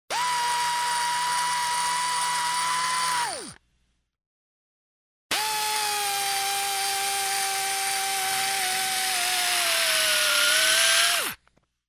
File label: Electric screw driver; SOUND EFFECTS1